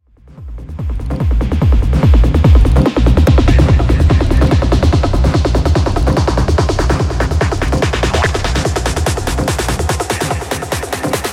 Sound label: Hard Groove Techno; Teknovault - Hardgroove Techno Production Suite; Techno多风格采样套件包